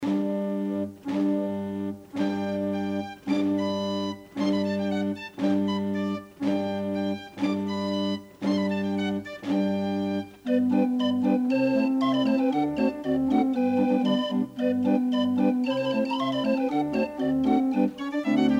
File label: draaiorgel; de engelekast